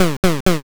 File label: pig